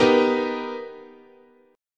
B Augmented Major 7th, guitar chord

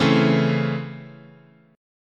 C sharp Minor Major 7th Sharp 5th, guitar chord

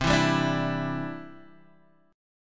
C Major Add 9th, C, chord

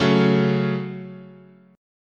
Db Suspended 4th Sharp 5th, chord

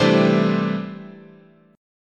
C 6th Add 9th, chord, guitar

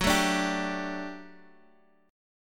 D Suspended 4th F sharp, guitar

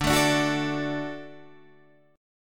D Major D down Guitar Open D

